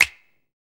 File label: DRM OLD, Dance Synth; Sound & Vision - Gigapack I CD 2